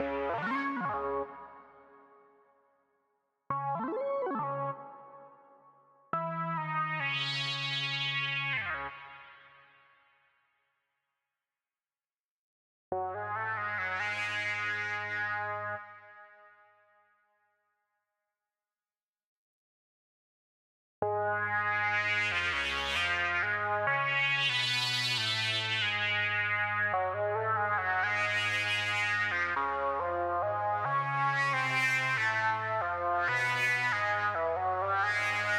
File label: Breakout Psychedelic Mix Stem Layer; Breakout Recharged